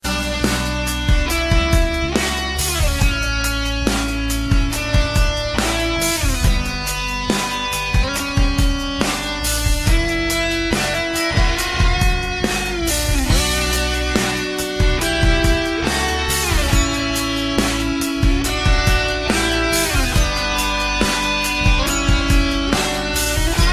great rock ringtone

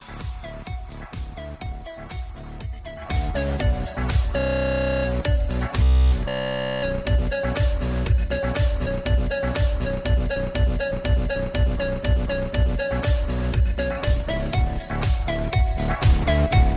samsung a20 ringtone

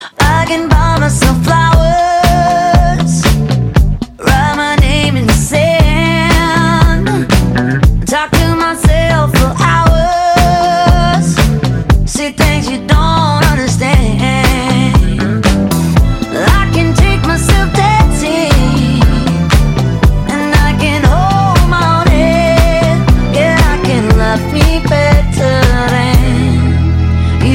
romantic english song tone

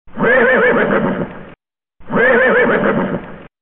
horse sound effect

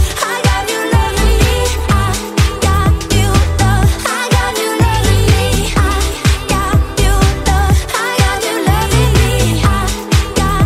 new love english ringtone

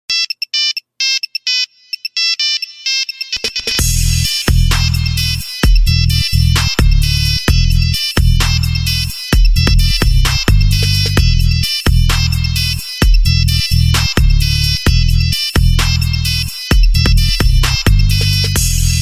nokia kick remix ringtone

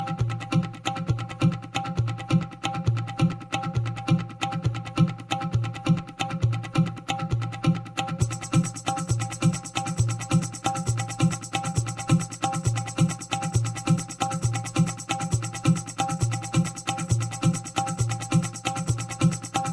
tabla trance ringtone

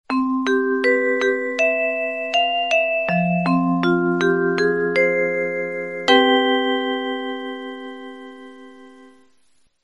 ting ting tong romantic musical tone ringtone; iphone ringtones

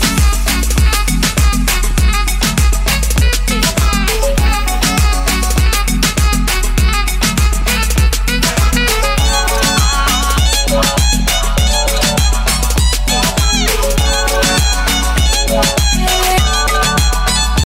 music ringtone bollywood ringtones

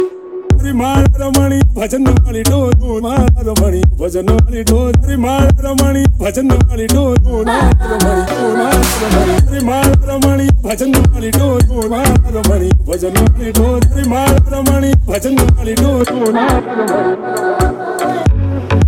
iphone 12 pro max remix ringtone

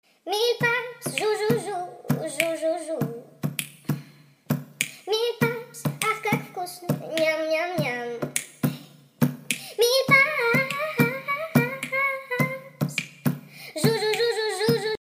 zu zu zu music ringtone

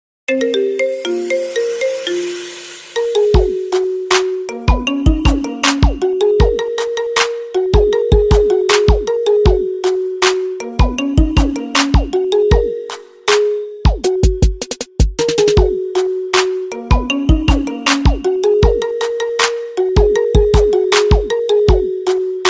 chain ringtone; iphone x; iphone ringtones

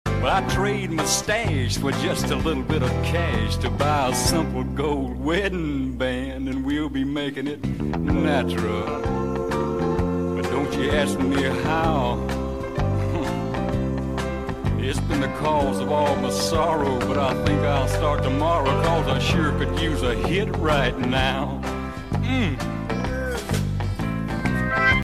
classical song tone ringtone

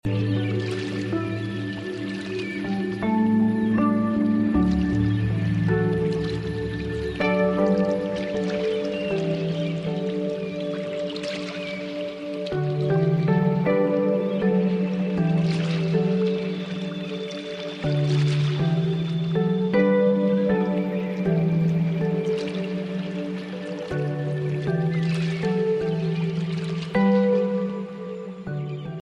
cave of tranquility light music tone ringtone

